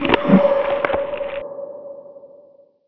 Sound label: attack; mystic radar; mr zombie1